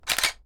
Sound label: Single Camera Click With Flash